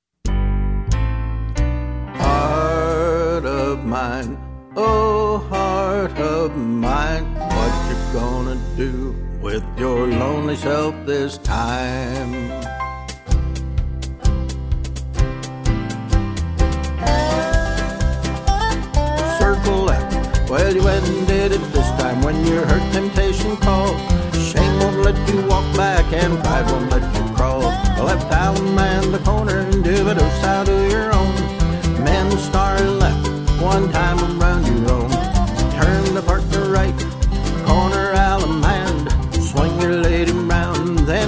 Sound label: vocal